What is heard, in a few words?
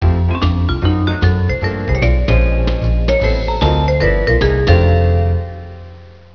jazz